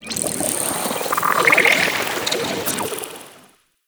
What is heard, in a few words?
Excavation Scanner Random; Warframe; Missions